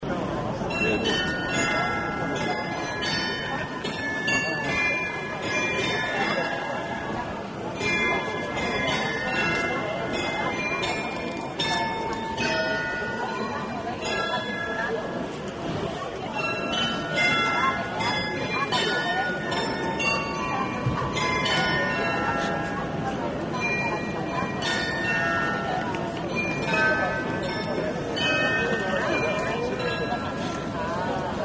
Muenchen Glockenspiel

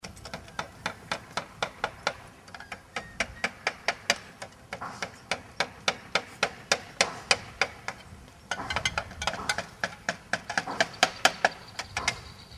Dachdecker

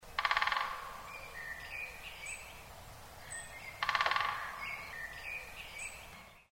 Specht

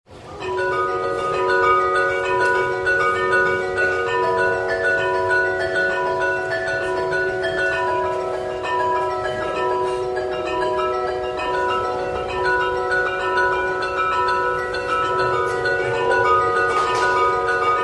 Glockenspiel Bremen

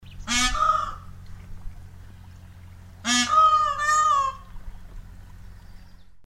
Pfau; Der sehnsuchtsvoll klingende Ruf des Pfaus.

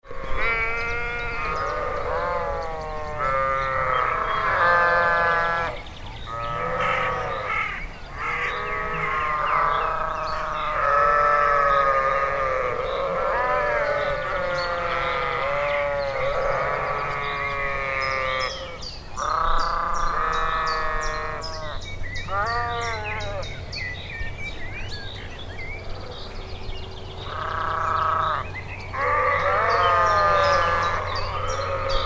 Schaf-Chor

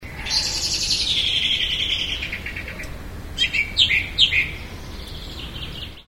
Amsel schreckt

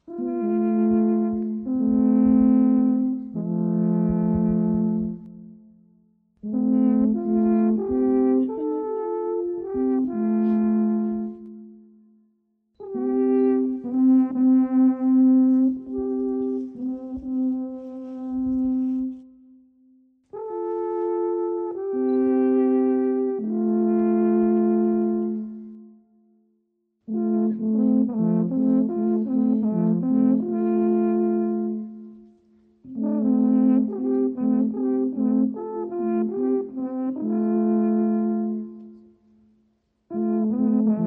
Alphorn Duo; lang

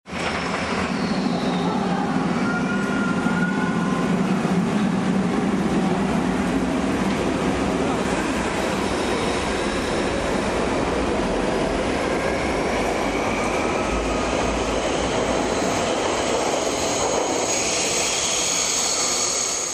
Metro Rom

